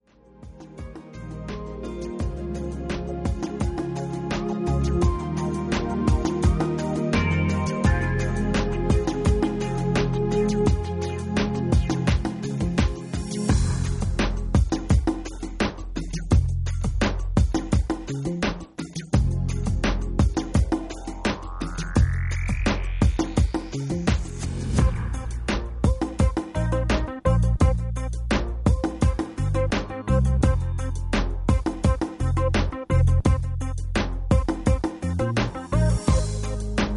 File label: Instrumental Asian Lounge cafe version